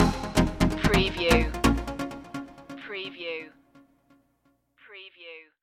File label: let go stinger